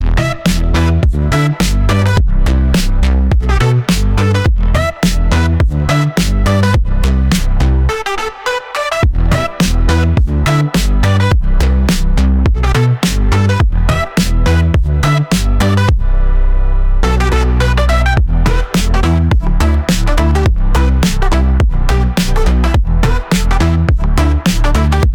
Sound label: Punchdance